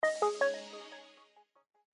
Power Off, phonetones system

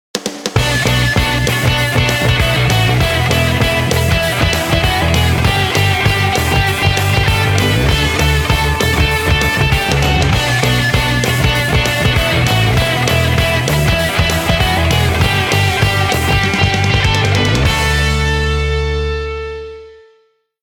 train, ringtones; Sony Xperia miro

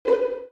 dial tone orchestra 7; Oppo Neo 5

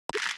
delete, Nokia 808 PureView

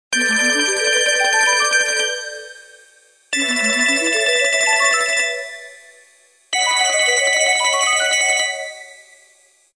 Musical, ringtones

